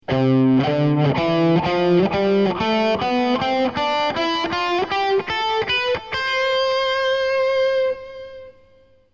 c major scale, guitar

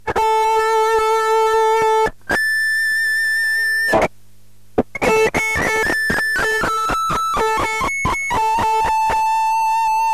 pinched harmonic, guitar

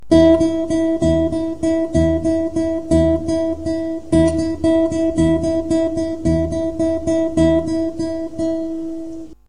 fingerstyle acoustic

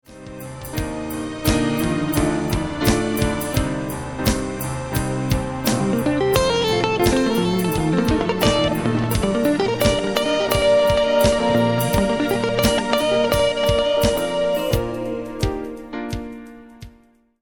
changes solo, guitar

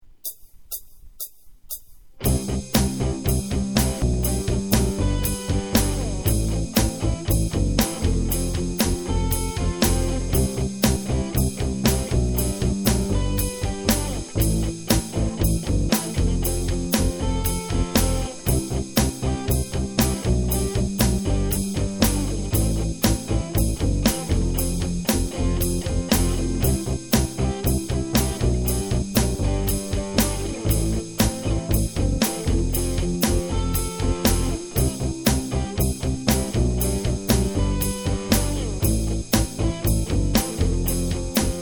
c maj pent scale track

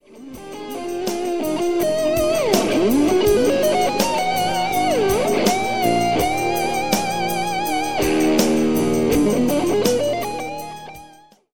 diminished run guitar solo

